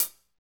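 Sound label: HH, hi-hat; Sound & Vision - Gigapack I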